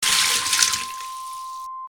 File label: water